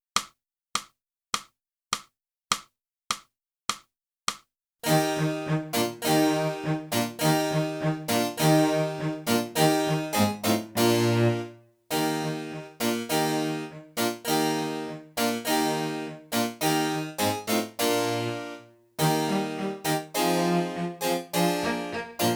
clarinet

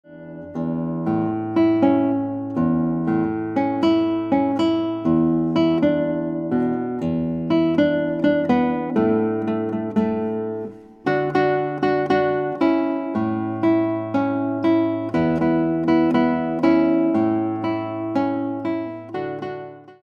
Guitar

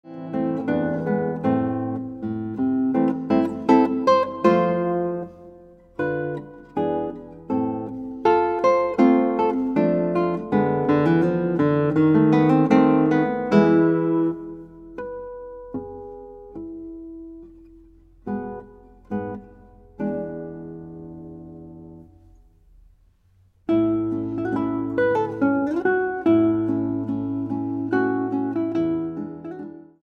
Guitar

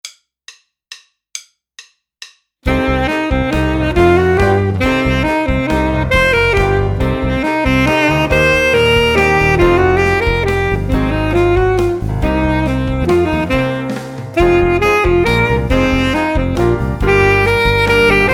Full Sax

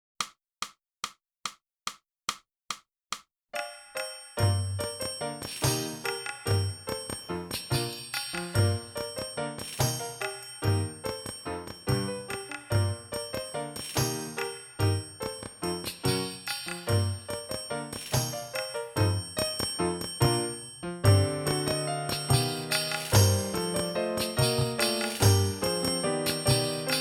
sax

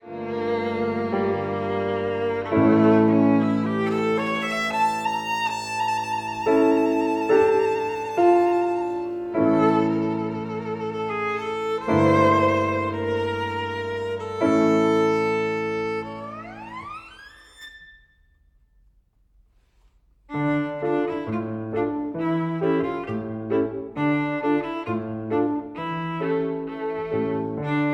VIOLIN; Trinity College London violin exams for 2016-2019

